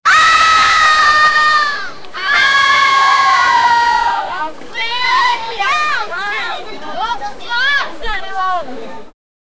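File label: two hundred japanese girls, street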